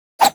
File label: Swing, Weapons; Dual Zoren Prime